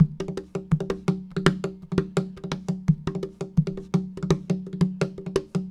AFRICAN LOG DRUM, LIVE ACOUSTIC PERCUSSION; Sonic Foundry (Sony Creative Software); Vir Unis Infusion

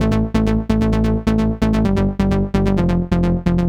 NRG Bass, Trance

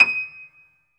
piano D#6; AKAI S-Series CD-ROM Sound Library VOL-8

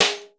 HOUSE DUST S, DrumBase5000; Crypton - DrumBase5000; HOUSE #1

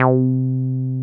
303 C4 mf; Drums In Your FACE!

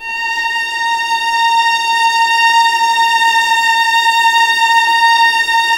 A#3, Ultimate String Collection, VLAS EXP; LEG

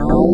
Odd Voc C2, Club Techno FX; Zero-G

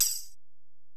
Ac Perc, Drum Machines Alesis DM-PRO

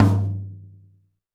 TD-20 Tom A, Roland TD-20 Toms

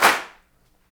CLAPS, Africa Handclaps

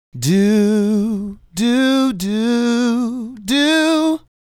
Male Solo, Hip-Hop and RnB Vocals

